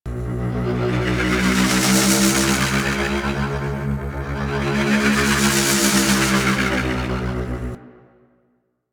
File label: Crp Ship Hologram Loop F; Ambience; Props; Warframe; Corpus Ship Remaster